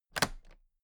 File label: toolbox latches close heavy